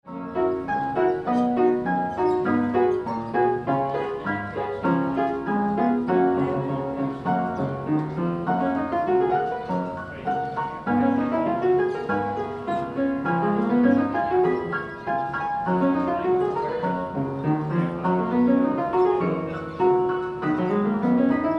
State Fair Musical Museum Player Piano

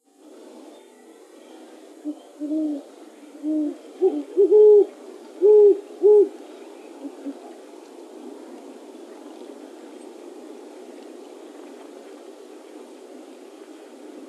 great horned owl; paul marvin

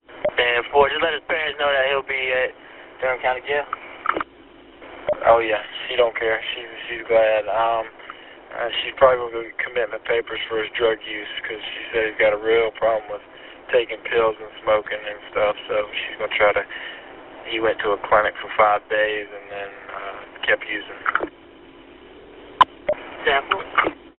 police traffic; DPD

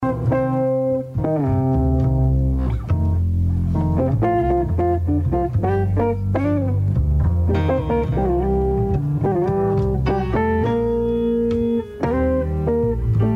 jazz

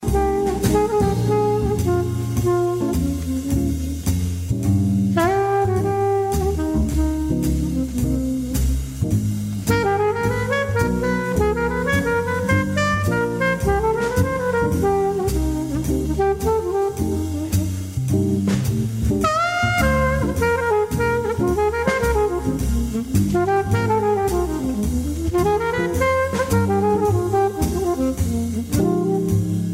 jazz

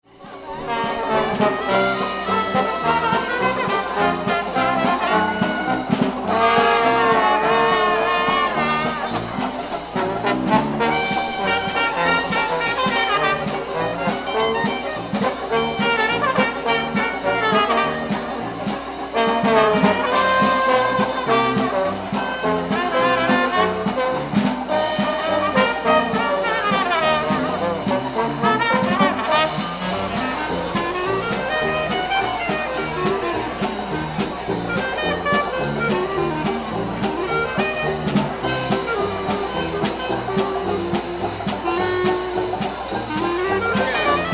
music ragtime

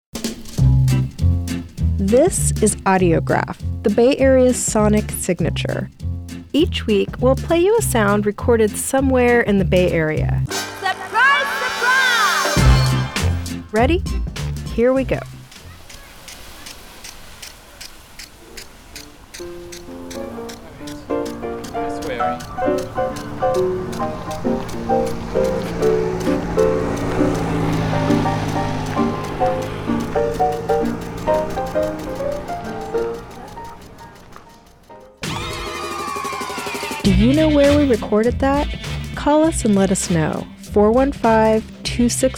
Flower Piano

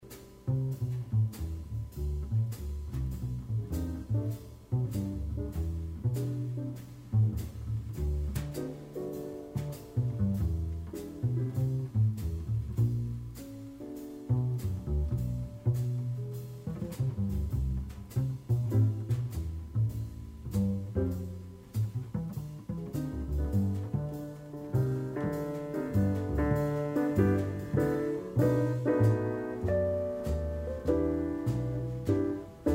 jazz

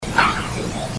Piano Room KNOCK ON WOOD

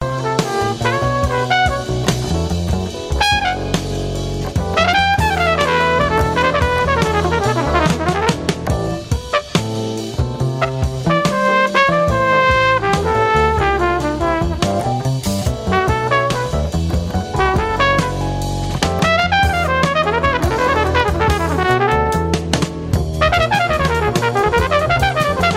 jazz

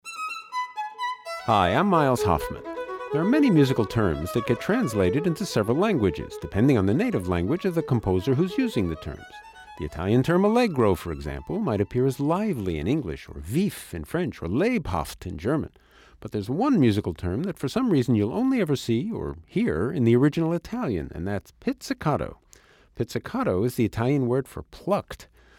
pizzicato

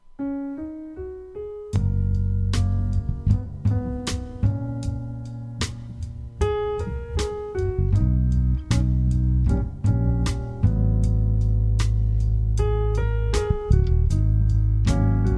jazz

